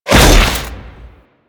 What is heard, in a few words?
Staff Impact B, weapons